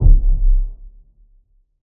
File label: Stress